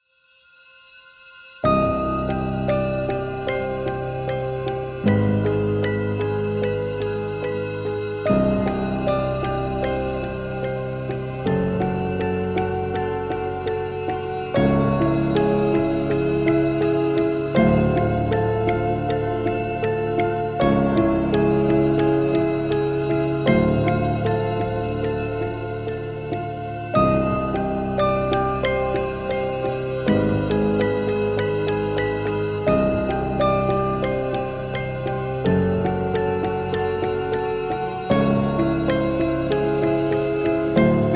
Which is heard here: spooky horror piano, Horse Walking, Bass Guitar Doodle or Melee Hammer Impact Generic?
spooky horror piano